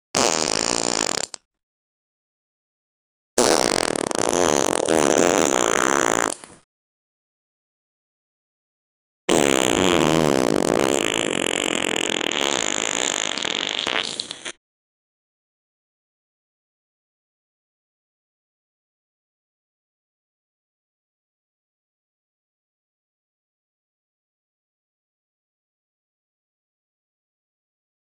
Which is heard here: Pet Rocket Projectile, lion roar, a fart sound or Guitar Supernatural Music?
a fart sound